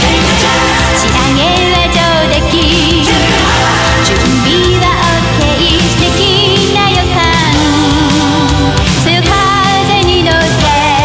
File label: BGM Reach; Mahjong Fight Girl (Arcade) (gamerip) (2023)